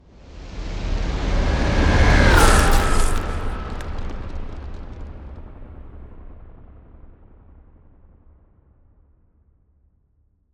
Ice Spell Whoosh, Cold Whooshes; Arctic Aura; Snowflake

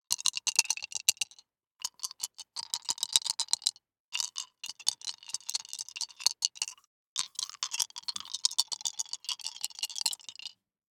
DICE in Two Hands, Shake, Three Dice; Samples; ArticulatedSounds; DICE Sfx Party (Extended)

